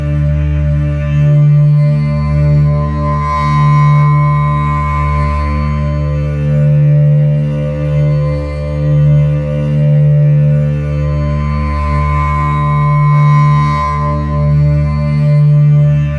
Temple Chord; Zombie Temple

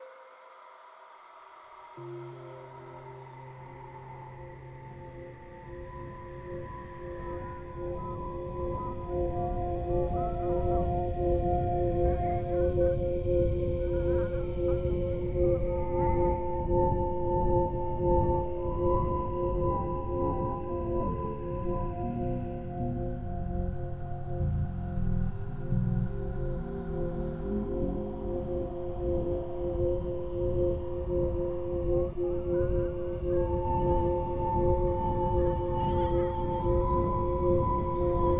music space ambient; Enyo

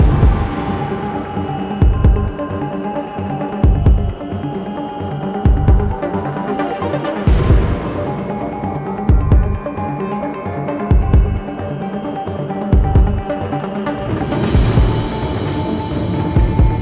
Sniping; Ryogoku Sniping; Modern Combat 5 Blackout

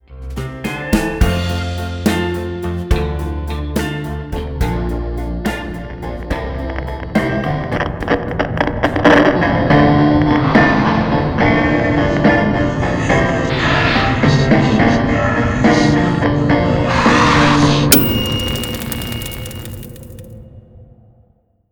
Loading Screen; Green Run

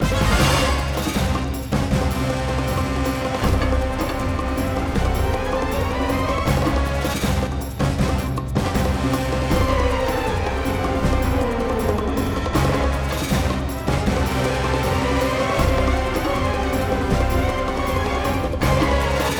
Boss Skeletal Dinosaur Loop; New Joe & Mac Caveman Ninja

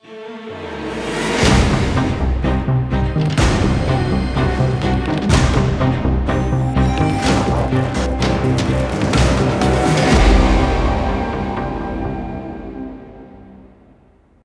Multiplayer Venice Start; Modern Combat 5 Blackout

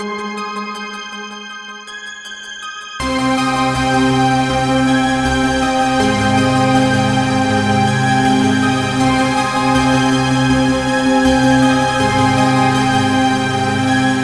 Music; Void Bastards

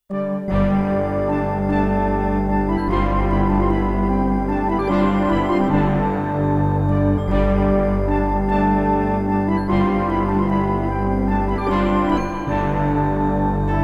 Big Victory; darklands

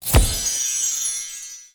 Fx Shadow Hit

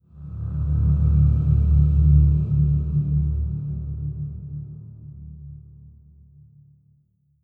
Intro Basse

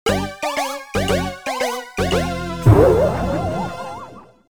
MISS! (Lose); Twin Qix

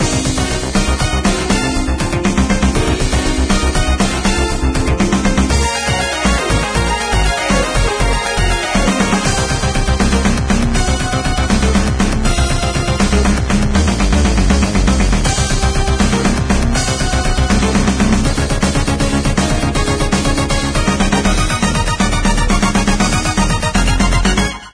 Shivery Beat; Contra Evolution; android

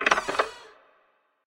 Object Office Door Open

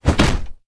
Club; The Battle for Wesnoth

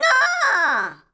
Poke Tail; Talking Tom Cat 2

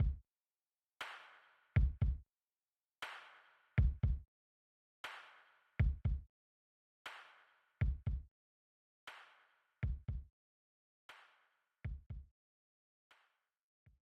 Elevator; Elevator 4 (Intro)

